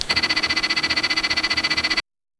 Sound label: a ink projectile; projectiles